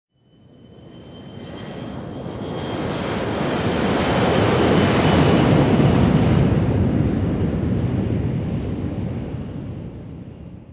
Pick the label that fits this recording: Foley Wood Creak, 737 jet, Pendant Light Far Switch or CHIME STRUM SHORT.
737 jet